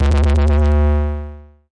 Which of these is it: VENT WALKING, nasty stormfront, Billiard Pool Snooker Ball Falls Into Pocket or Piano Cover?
nasty stormfront